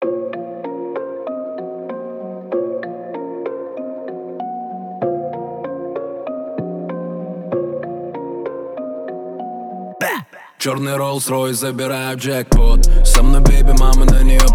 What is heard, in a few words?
28-29-31hz Low bass; Rolls Royce